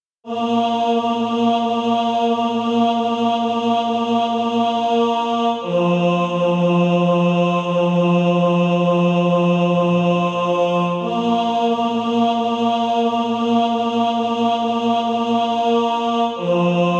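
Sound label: tenor, Practise Music; Fountain of Purity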